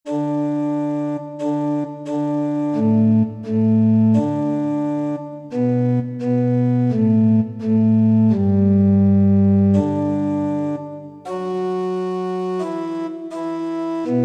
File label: Pitch 440 Temperament Meantone